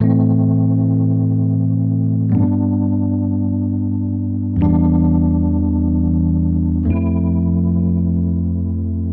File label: 105 BPM A Min Chords; Loop Stems; Cymatics; Cymatics Gems Vol 6; Confessions